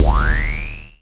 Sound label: EBASS FX, Dual Percussion Synthesiser; Klone Dual-Percussion-Synthesiser